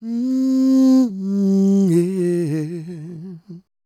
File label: A MMM, Vocal Blues Soul; Spectrasonics; Planet